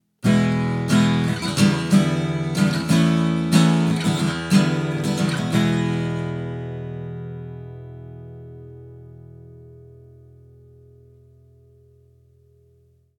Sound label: ACC 30cm SM57